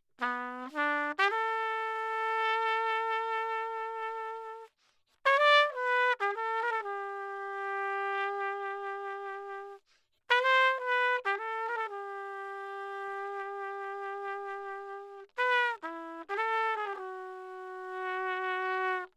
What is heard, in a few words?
trumpet; lewitt; mtp940 cm